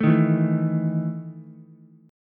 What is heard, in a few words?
E Major 7th Suspended 4th, Ukulele